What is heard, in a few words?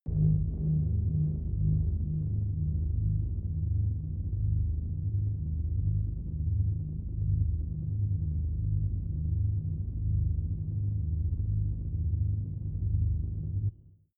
space dark scary ship; raumschiff